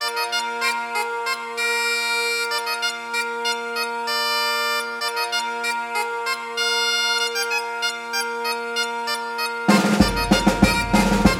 Hilarious bagpipes

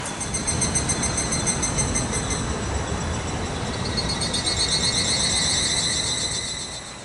Higurashi

